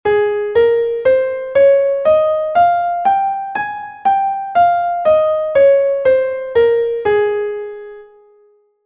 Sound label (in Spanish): sol la